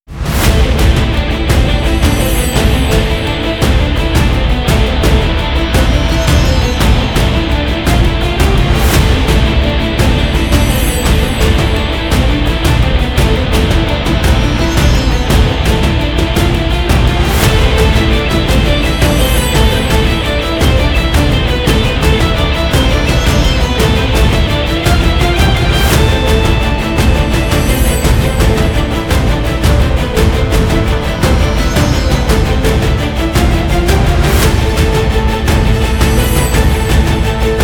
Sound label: project tension guitar; by burak sound bank; tension gerilim vol 6